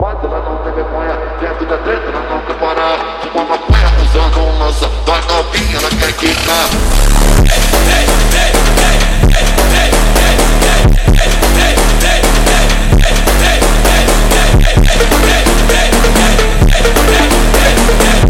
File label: Phonk Music; فانک phonk